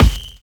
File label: SONAR BEA; Univers Sons - Kitch 70s